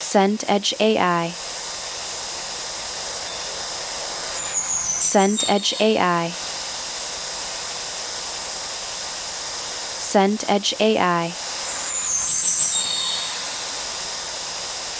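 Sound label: ambience park day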